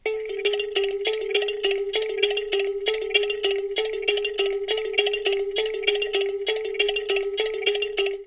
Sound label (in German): spieldose; warm audio wa12